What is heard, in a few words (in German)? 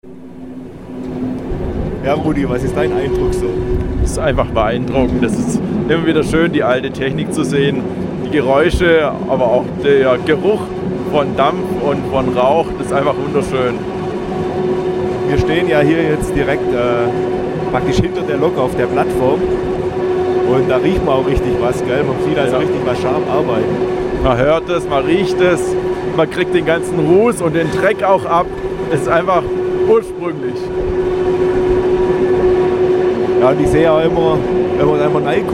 Dampf; Rudi Peter; wissensstrahlung